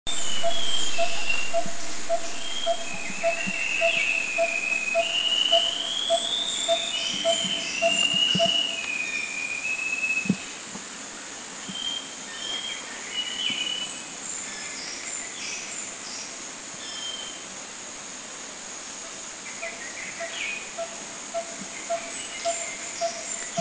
Black Shama Coppersmith Barbet, Philippines

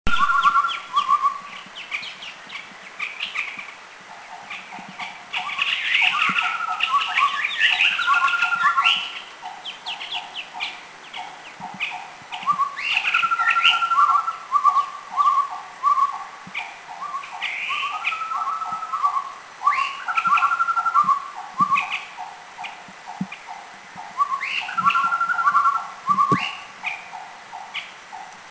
Chestnut-rumped Babbler

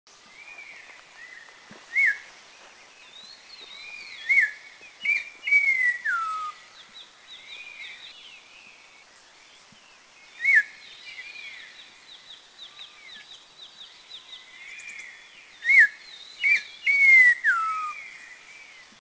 Grey-sided LT, Dirang, India

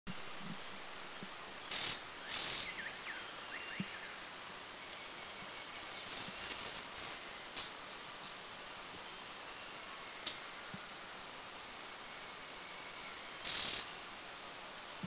Small Niltava Song & Chatter; Mishmi; India

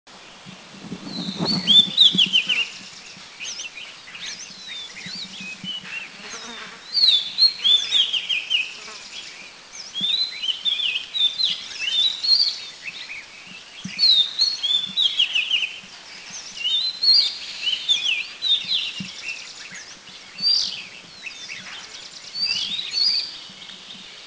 Oriental Magpie Robin, Doi Inthanon; Thailand

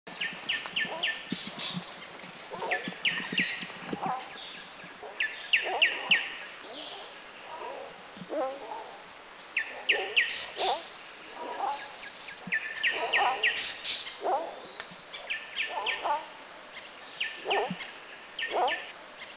Striped Tit Babbler, Thailand; Pin-striped Tit-Babbler

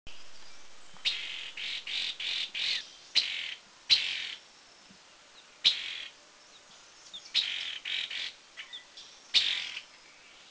Cachar Wedge-billed Wren Babbler Chatter; Mishmi; India

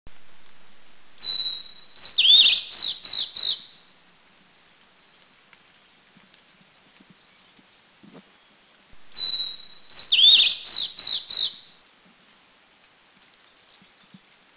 Steeres Liocichla Duet Anmashan; Steere's Liocichla Liocichla steerii Country endemic; Taiwan; common throughout in the mountains

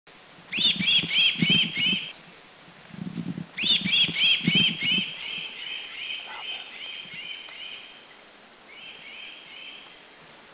Bar-bellied Cuckooshrike, Philippines; Bar-bellied Cuckoo-shrike Coracina striata; Subic; A few seen Rajah Sikatuna and 10+ birds daily in Subic.

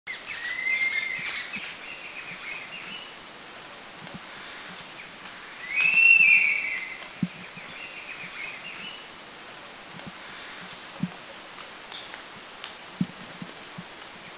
Philippine Serpent Eagle Bohol

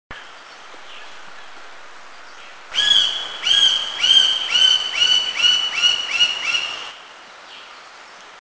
Bay Woodpecker; Eaglenest; India

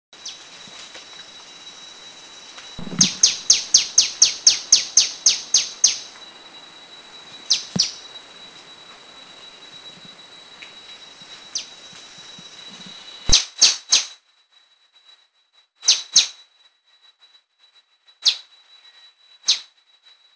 Purple-naped Sunbird; Hypogramma hypogrammicum; Thailand; Krung Ching

